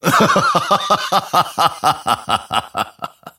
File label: grimstroke laugh; dota